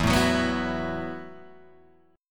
E Suspended 2nd Suspended 4th E, down, Guitar, Open G